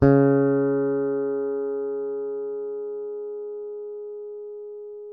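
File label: Yamaha fingered C#3, Bass; Masterbits CLIMAX 7; PATITUCCI BS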